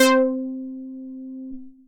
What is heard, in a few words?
New Wave, Wavetable, Synth Lead; Strike me; Ableton; Factory Packs; Synth Essentials